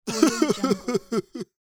man nerdy laugh

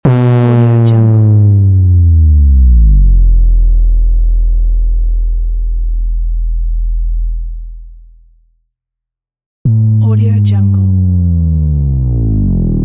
slow motion impacts textured

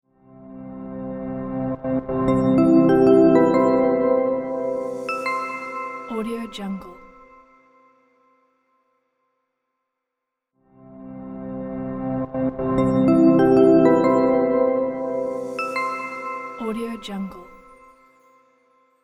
ambient tech logo

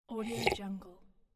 drinking

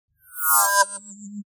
ui scifi screen shut down double swell

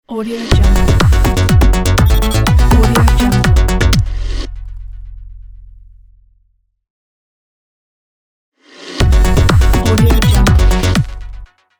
modern glitch logo

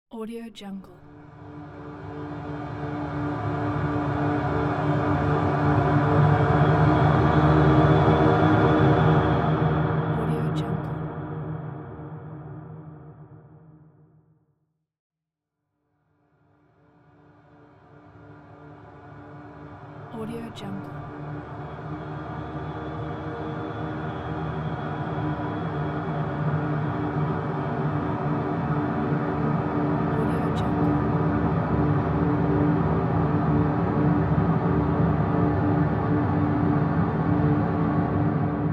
tension atmosphere

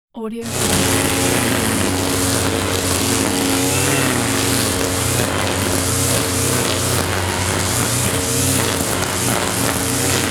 lawn mower cutting grass

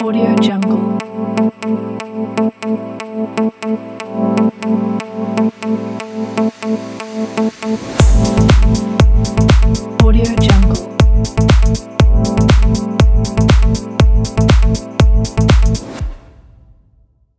fashion house logo

